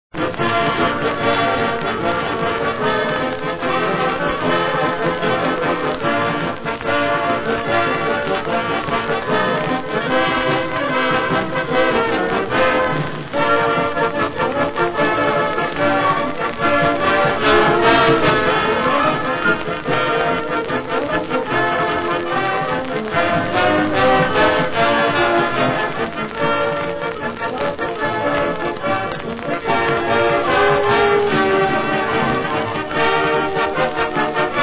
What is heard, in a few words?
Unfiltered Excerpt RCA Victor Miami March Song